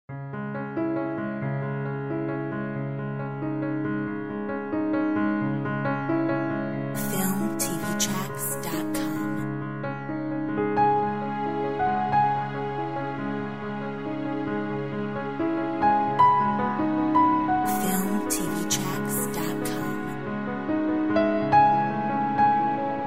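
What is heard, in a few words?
Orchestra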